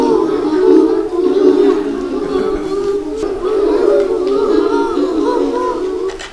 Kids Great Horned Owl; Park

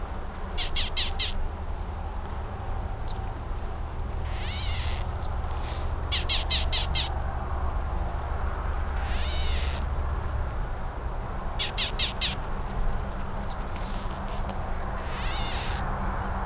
California Gnatcatcher

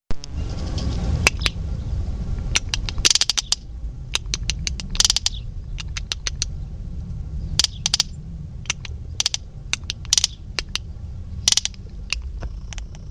Roadrunner Clicking; Park

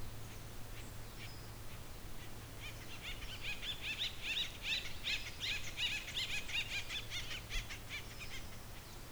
Acorn Woodpecker; Park; Andrea Cornelius

